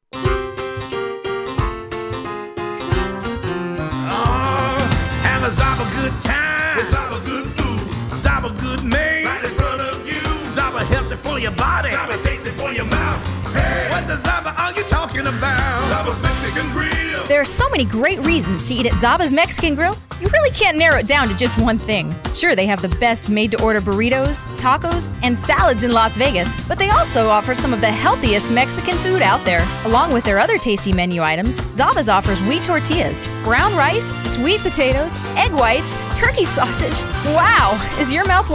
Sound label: March; Radio 60